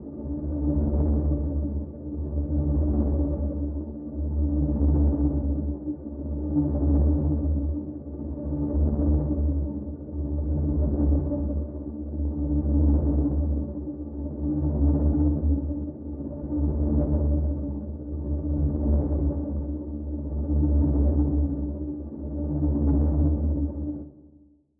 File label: Dark ambiance